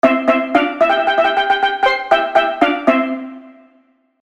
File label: Ringtone Steelpan